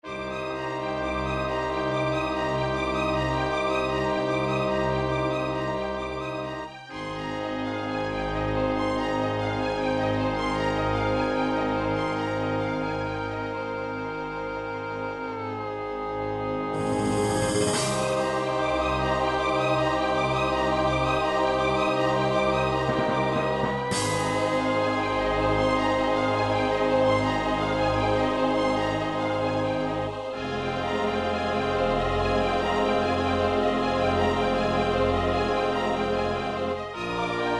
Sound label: aquatic ambiance orchestrated; super nintendo; sequenced; donkey kong country